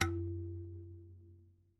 instrument kalimba

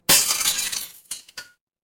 glass shatter

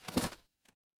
paper fabric inventory

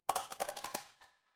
glass crack

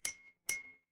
glass clink

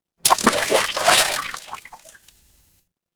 water splash

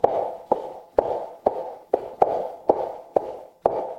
footsteps heels

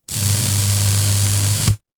fire electric spark

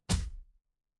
retro game hit

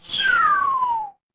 cartoon slide whistle

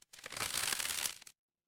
paper fabric crumple

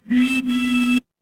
ui power on

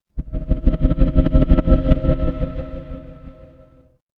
mechanical engine

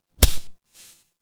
transition braam

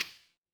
ui click

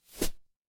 paper fabric fabric

